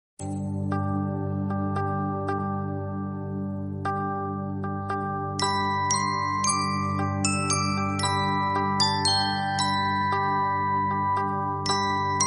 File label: after a thunderstorm, lullabies for babies